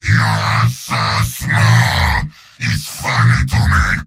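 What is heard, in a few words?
yell, robot; heavy; mvm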